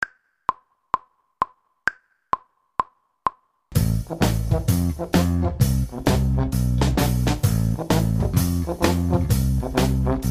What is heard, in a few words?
baritone section